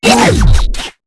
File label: death; baseq2; Beep